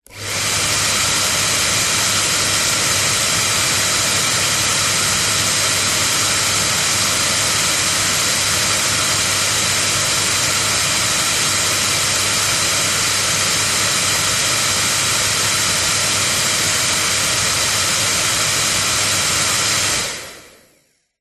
household hairdryer on run static off front visage professional